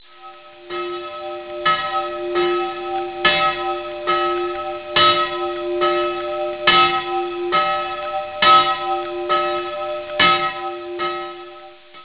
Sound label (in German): glocke, glockensound; pfarre